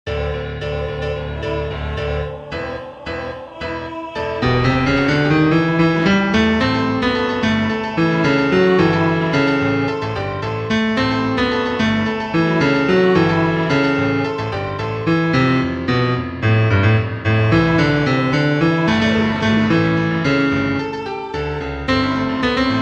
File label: bass